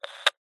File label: cb radio cheap static burst click through speaker button press; zapsplat